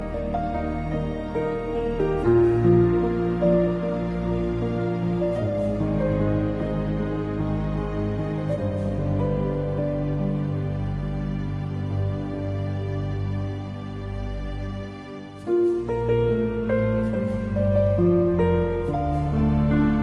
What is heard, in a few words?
Romantic Tune